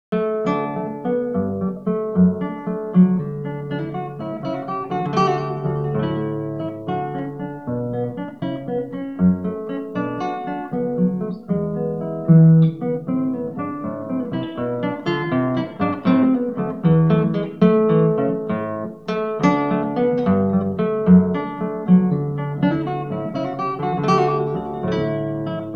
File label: Suite for Cello No. 1 in G Major Gigue, Guitar; Bach; BWV 1007